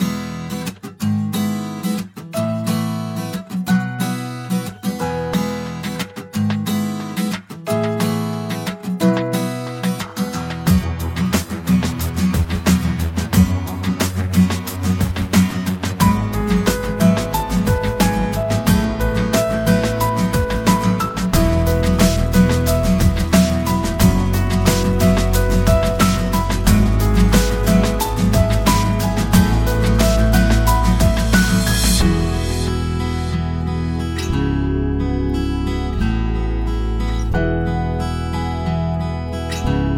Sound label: acoustic; impact soundworks; acoustic revolutions 2